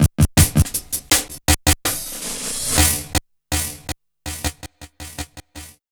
Stretcher 162, Drum Loops, Jungle; Jungle Warfare 1&2

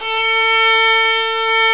SOLO VIOLIN; AKAI S-Series CD-ROM Sound Library VOL-7

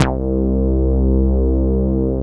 MOOG PRO, BASSES; Masterbits - Vintage Voltage; VV BASSES

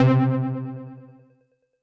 Synth C, Synth Prophet One Shots; Electronisounds

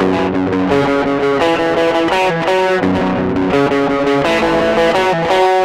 Guitar, Trip-Hop Loops

